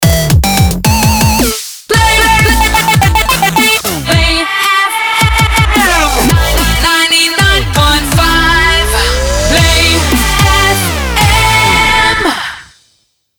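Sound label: 110bpm Amin MIX Main ID, jingle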